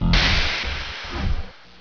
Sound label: door move